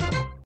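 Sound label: fanfare end of ball, pinball; sfx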